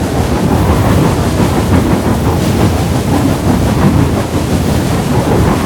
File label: attack helicopter wash loop; hl2